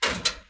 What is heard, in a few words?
Gate locked; Phasmophobia